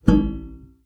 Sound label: Classical Guitar Extended Technique